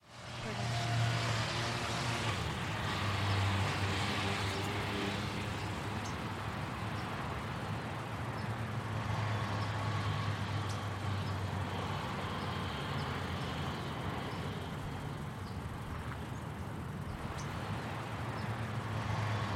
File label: traffic sounds and nature; soundscrate